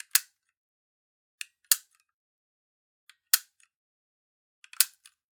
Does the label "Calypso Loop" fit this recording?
No